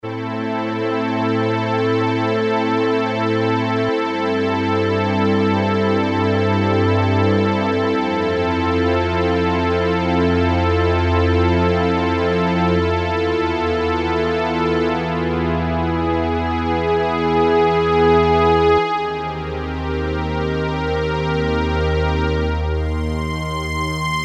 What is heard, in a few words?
Combi-String, Roland W-30; Factory Performance Patches